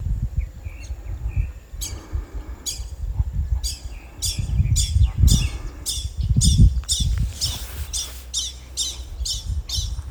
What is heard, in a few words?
black bellied whistling ducks